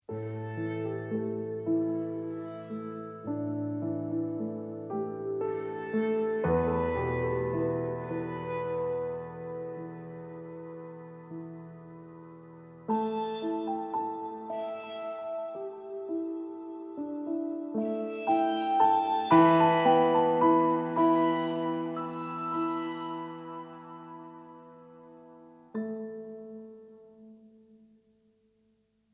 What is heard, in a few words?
violin harm brush